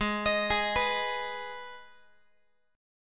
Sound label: G sharp Minor G sharp down Guitar, chord; G#m arpeggiated; JGuitar